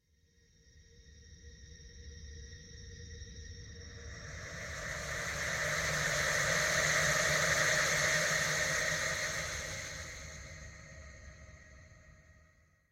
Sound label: Shudder ghost; SyrinScape SoundsScape Collection; bbh Haunting part 1; TTRPG